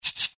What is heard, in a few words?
cabasa